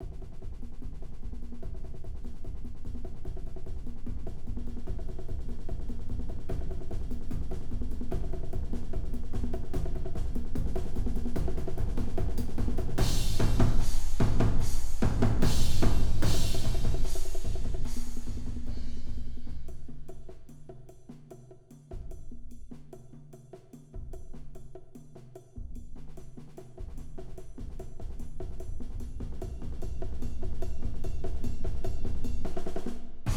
Percussion Ensemble 7 Players, Instrumentation: Snare, Concert Toms, Bongos, Concert Bass, Hi-hat, Crash Cymbal, Ride Cym